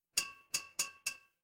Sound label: glass glass tap